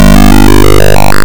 bonus life, It generates a rising pitch over 63 frames; by calling the ROM BEEPER routine at $03B5 with decreasing pitch values; River Raid